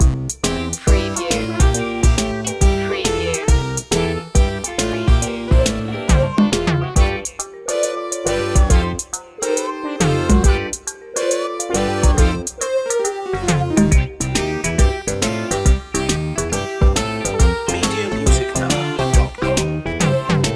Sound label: retro video game blues loop